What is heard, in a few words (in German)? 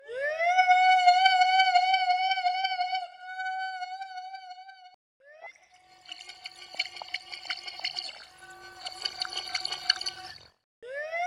ruine harm noise transition